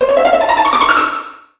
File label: load fly